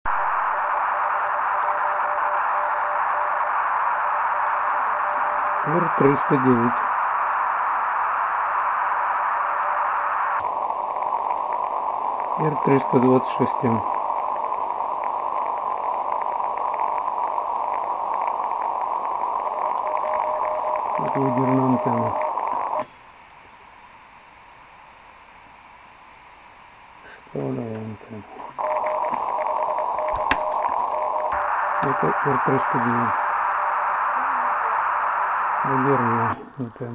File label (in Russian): Shumy R326M R-309 14 MHz